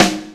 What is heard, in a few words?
prominent snare one shot d sharp key